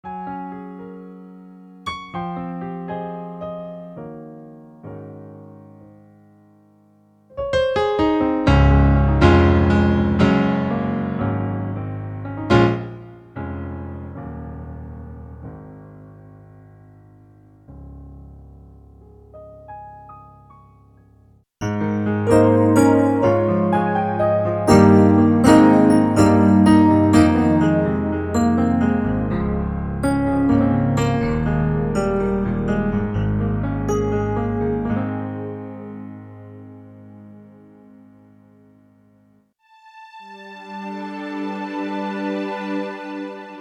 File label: Roland JV; roland-cartessons